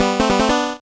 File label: get sfx